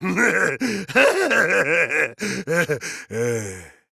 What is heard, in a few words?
cry; monokid